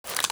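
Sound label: weapon foley ak47 reload lift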